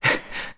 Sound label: Stamina Low; Link running with slightly low stamina; Skyward Sword; zelda